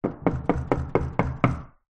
household knocking wooden sliding door slight reverb